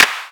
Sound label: Clap Synth Slap Shot; One Shots; Drums; Ableton Live 12 Lite; Core Library